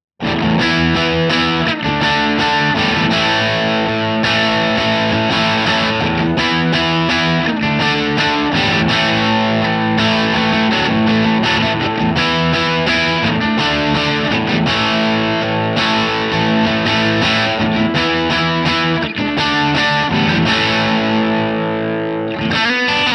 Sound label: crunch; jensentone